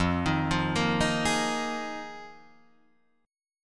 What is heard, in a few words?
F Minor 11th, Guitar DADGAD